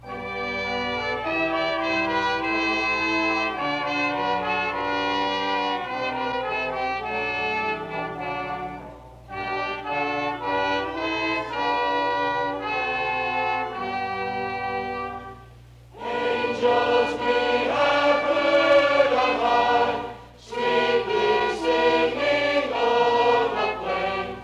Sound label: Concert Choir, Angels We Have Heard, French